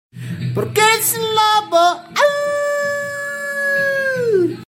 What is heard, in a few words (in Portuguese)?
lobo